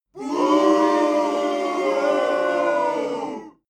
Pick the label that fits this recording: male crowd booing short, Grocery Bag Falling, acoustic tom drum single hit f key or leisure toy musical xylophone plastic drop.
male crowd booing short